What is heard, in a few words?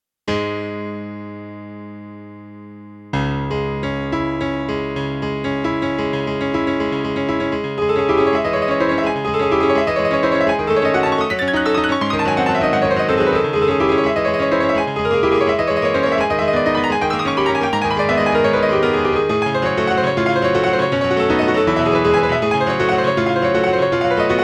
Classic Grand; SQ-R Plus